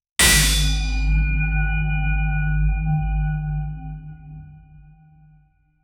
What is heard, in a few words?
aggressive hiit workout